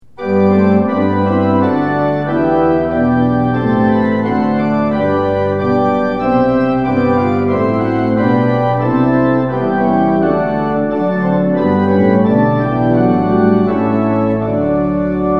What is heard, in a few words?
tune; hymns; lillenas